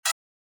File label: lead E5 eq